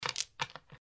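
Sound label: chips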